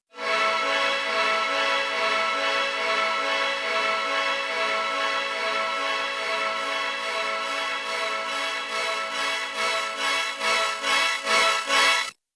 140 Am cruel chord build, lead arp chord melody

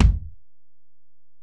Ac BD, Alesis DM-PRO; 300 Drum Machines

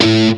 METAL SINGL; East West; Global Sounds

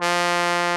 BRS TROMBO, Trombone; Roland L-CDX-03 Disk 2

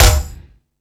Dance 2000, Drum Machines E-MU Pro-Cussion

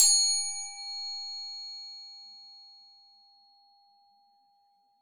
TEMP G#6, temple bells; SoundBytes - Early Patches

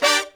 BRAZ LICK, BRASS LICKS

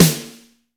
SNR SNAPPY, Snares; Roland - Rhythm Section

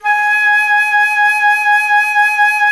FLT, WOODWINDS; Roland - LA Composer vol. 1

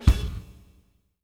OOPS, PROC SNRS; Sampleheads; New York City Drumworks VOL-1

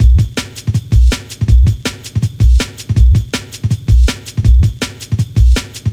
162, Loops, breaks; M8

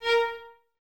String Ensembles, Violas, VLS Detache

